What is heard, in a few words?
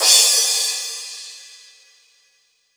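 cymbal, Drum & Bass 160 BPM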